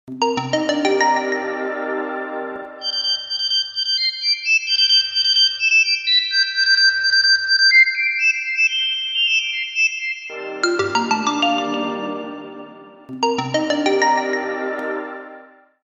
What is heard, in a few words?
Modern 3d Effect